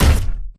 Footstep Jog Close; Titanfall 2

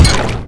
imp bullet lightballistic bubbleshield

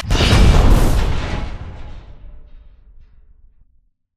spectre overload; Titanfall 2

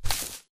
imp bullet lightballistic dirt debris; Titanfall 2

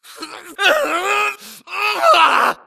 grunt Struggle; Titanfall 2

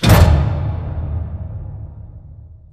pod door close start; Titanfall 2

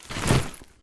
Grunt Mvmt FOL Soft Med; Titanfall 2

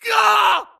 grunt death quick; Titanfall 2

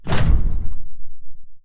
footstep generic core; Titanfall 2

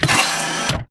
Stalker Mvmt Servo Damaged Headpivot Medium; Titanfall 2

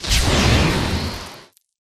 atlas dash close; Titanfall 2

